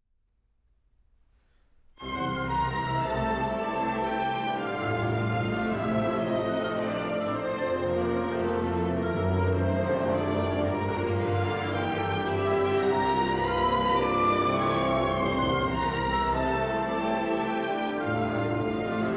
allegro con molto appassionato, orchestra

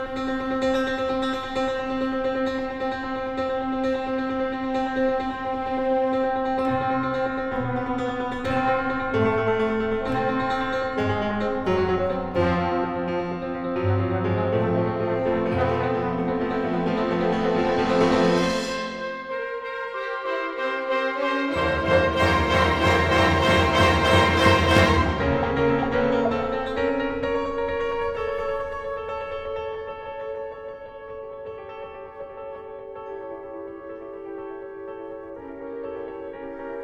piano concerto

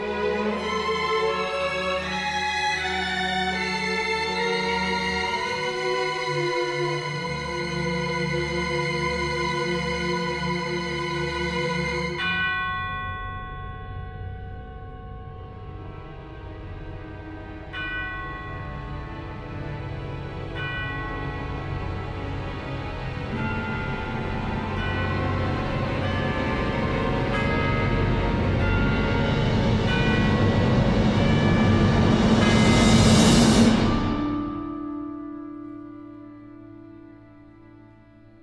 symphony, elegy, orchestra

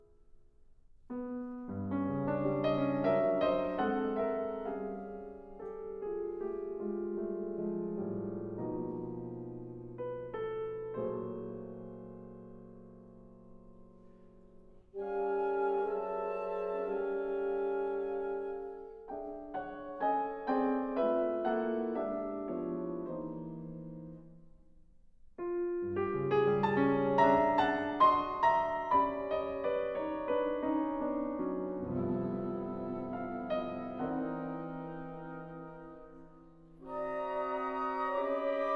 serenade for piano and chamber orchestra, elegy

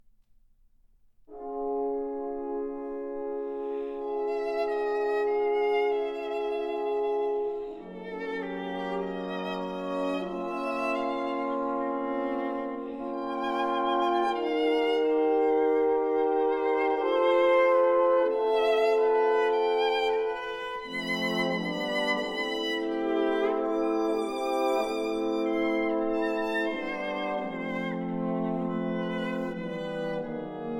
symphony concertante, quietly, orchestra

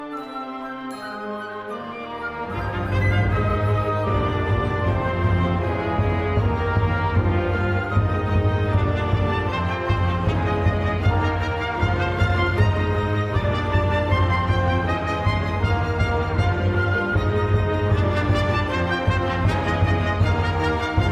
lush, orchestra

